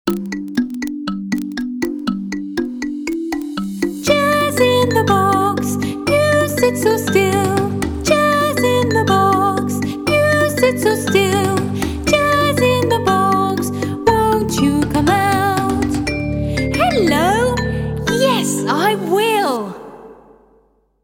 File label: Hello Jazz